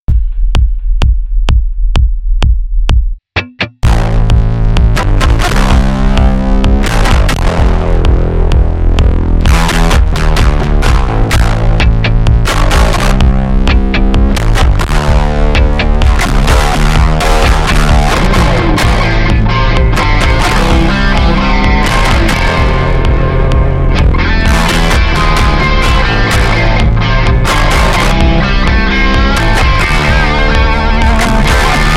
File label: bass idea